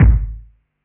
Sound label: Kick; Ableton Live 12 Lite Core Library